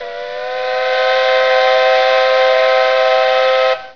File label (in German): Pfiff